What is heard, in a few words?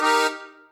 Organ Confirm; Levels Hub Swamp 01 Music Ray